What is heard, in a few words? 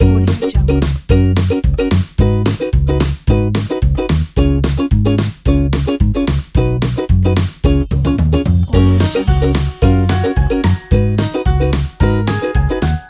happy kids pack